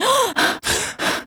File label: Realistic Fatigue Voice, female tempo; oblivion